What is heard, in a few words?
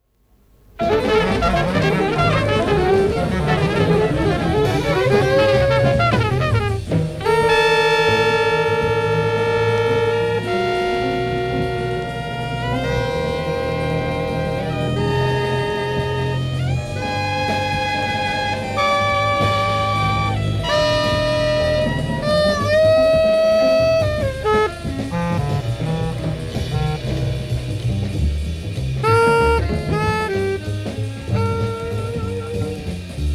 freejazz